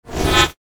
Whoosh Electrical; Distortion; Multimedia; Whoosh, Production Element, Imaging Element, Accent, Transition; Whooshes; Blastwave

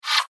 Laser Arrow Flyby; science fiction sound effect

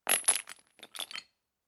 Handling Old Heavy Rustly Chain Dropped On Floor

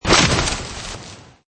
Skiing Snowboarding Landing After Jump Snow Slide; Skiing And Snowboarding Sound Effects

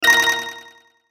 Hit Delay High; Blastwave

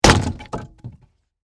Impact Wood Hit, Sledgehammer, Debris; Hammers; Tools

